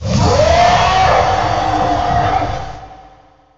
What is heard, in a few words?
death; quake2; character